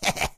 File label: Victim Miss Male; friday the 13th killer puzzle